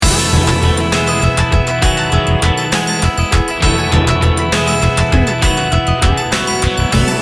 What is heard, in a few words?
celtic pop loop